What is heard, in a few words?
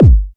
kick drive